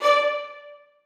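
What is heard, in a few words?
1st violins staccato C#5; Sonatina Symphonic Orchestra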